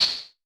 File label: HH, Vermona Mono15 Drums; Stereo layers; Swordfight